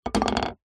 foley wood small pole down on plastic table; zapsplat

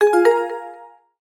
app alert tone

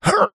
human male voice grunt groan lift heavy weight; zapsplat

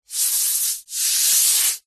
wood smooth hand rub; foley; zapsplat

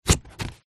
office scissors cutting paper single cut

